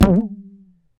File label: Bounce Material; angry birds rio